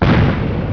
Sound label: BOMB, small explosion; freeuniverse